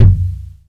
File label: tight lows bass drum single shot F sharp key